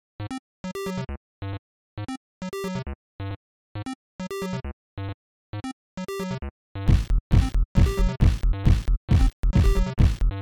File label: NES Acid House